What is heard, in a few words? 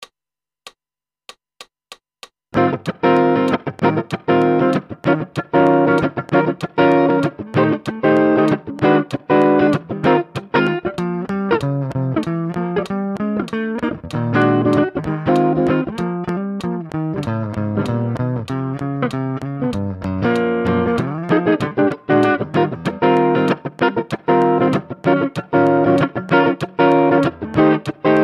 Guitar